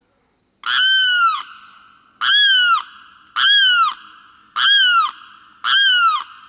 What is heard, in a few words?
Aramus guarauna; Chordata